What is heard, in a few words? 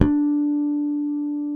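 Spector bass D3 harmonic; Northstar - New Gold VOL-1; SPEC.FUNKBAS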